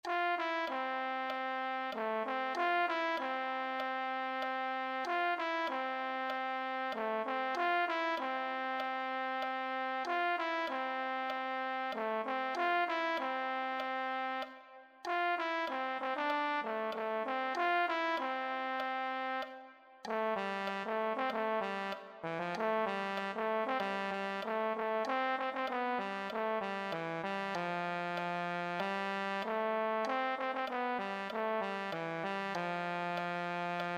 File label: euphonium